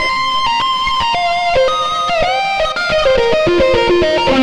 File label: xtra lead